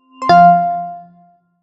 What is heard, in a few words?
iPhone 14 Pro Shutdown Sound